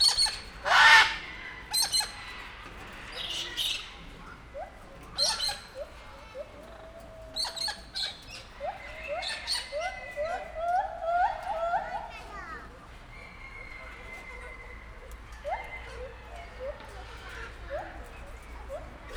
hangosabb a kéksárga halkabb a rózsaszínű kakadu; nyiregyhazi zoo 2019

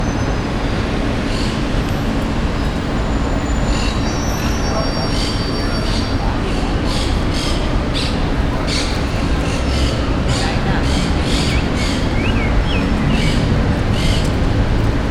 fa alol hullamos papagaj varos

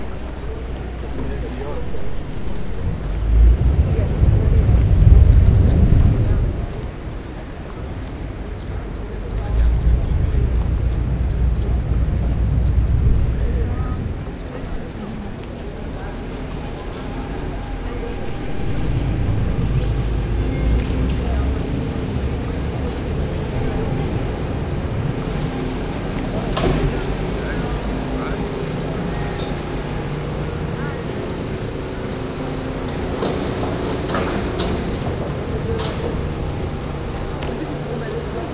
egy vizi jarmu, jobbra epitkezes; rialto

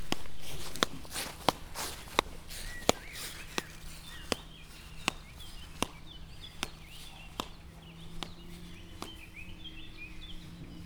fu von oda vissza, papucs; vizhaz; cardioid

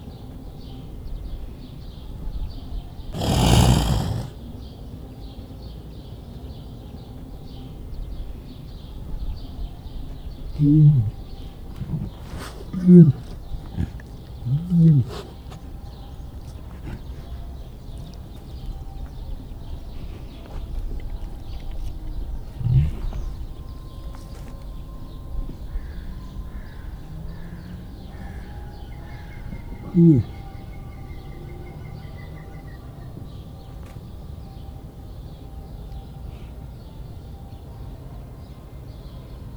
egészen közelről a hím, oroszlán; marantz puska; debreceni zoo 2012

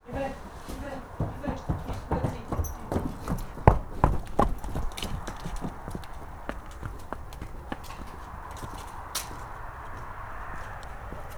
istállóból kivezetik távolban autó, ló; állathangok; paraszt udvar

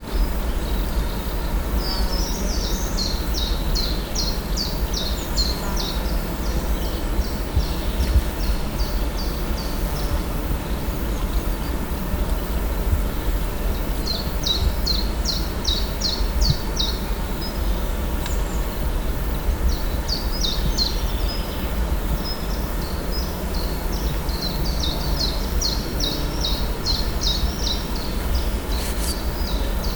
csilpcsalpfüzike; Potamia; termeszetben

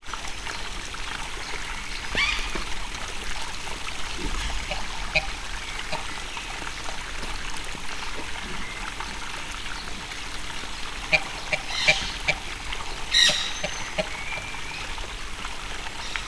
tóparti dill, vízimadarak; állathangok; miskolci zoo 2009

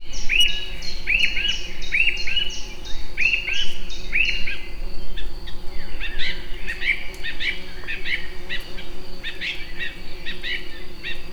enekesrigo egerturistahaz; termeszetben

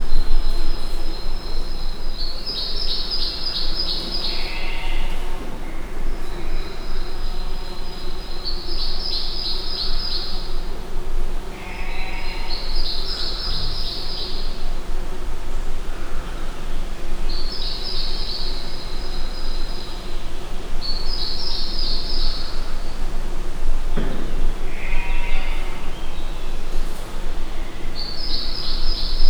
kulso terem, rovar; miskolci zoo 2018

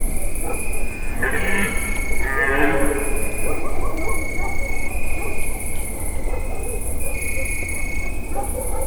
kutya tücsök; termeszetben

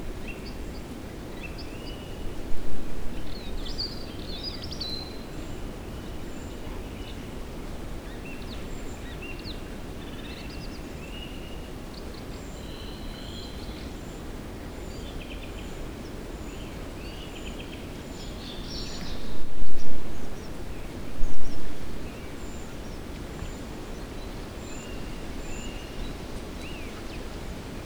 kozelben madarak tavolban egyenletes szelzaj menesviztarozo utan

